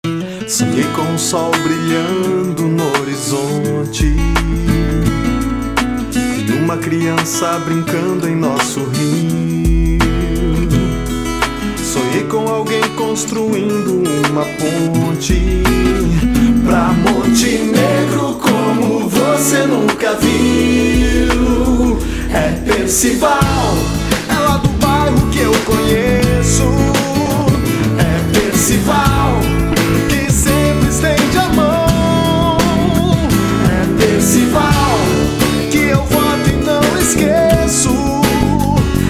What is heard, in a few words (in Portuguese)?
Jingle; Percival Prefeito